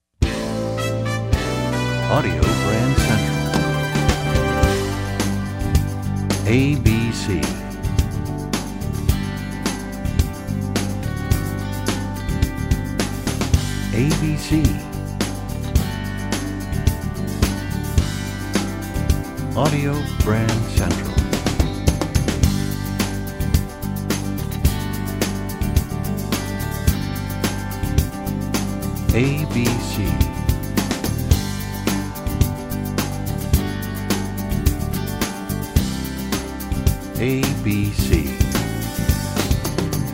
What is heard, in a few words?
Desert Wind, radio jingles